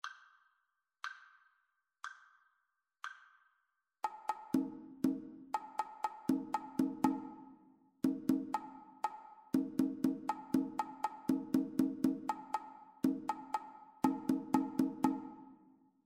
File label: two part rhythmic dictation